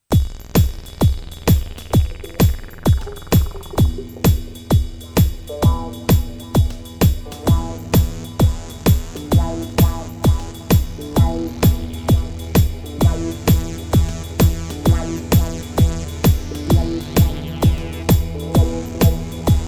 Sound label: Chill Guitar; Fill of Chill; prequel; xmas